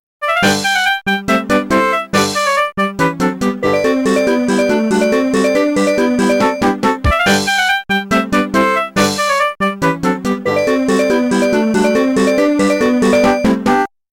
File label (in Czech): melodie